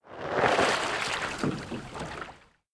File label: reed boat launch; Immortal